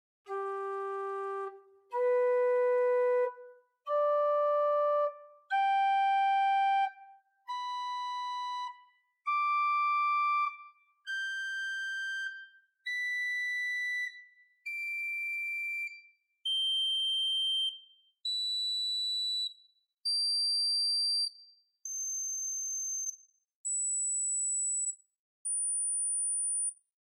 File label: Bw Quint1-1.3 arp; Grace Lutheran, Champaign